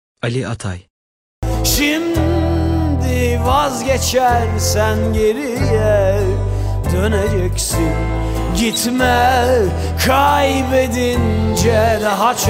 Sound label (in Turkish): vokal; Ali Atay